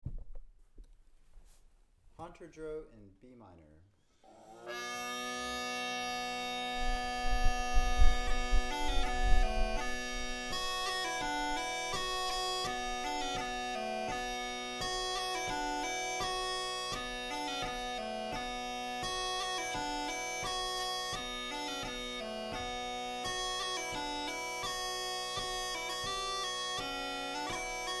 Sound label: breton hanter dro